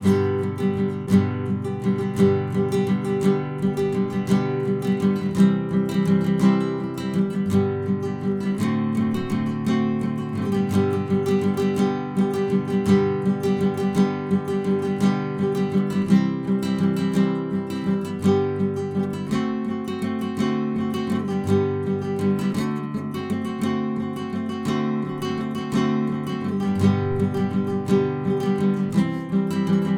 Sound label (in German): Wild Rover Gitarrenbegleitung